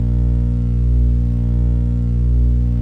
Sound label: hyperdrive; Star Wars Knights Of The Old Republic II; XBOX